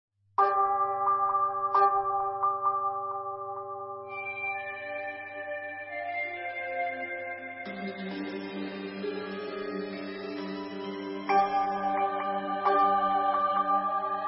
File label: bgm; LiveOrDie